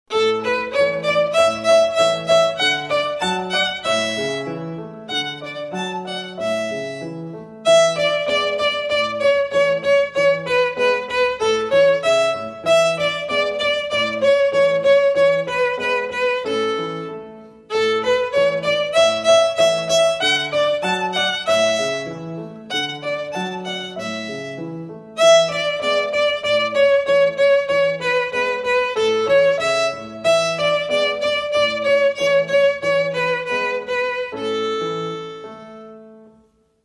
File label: Song of the Wind, Folk Song, violin; Suzuki Violin Method